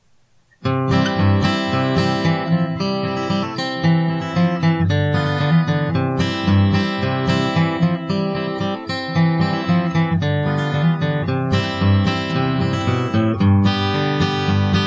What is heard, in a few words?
epiphone, used guitars